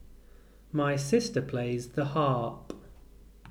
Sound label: p harp